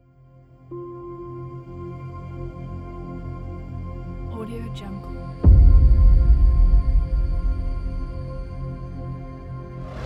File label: dark atmospheric teaser